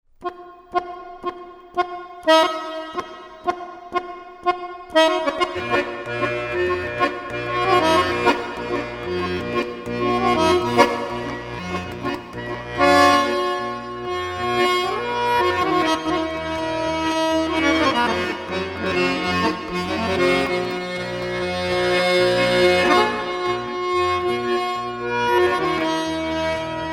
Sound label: Accordion PLATE; breverb